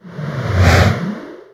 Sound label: Synthetic Blow